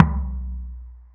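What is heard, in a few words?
TOM soft; Zero-G; Chemical Beats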